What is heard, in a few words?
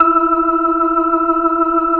E4, PERCORG; Sonic Reality; Vintage Timetraveler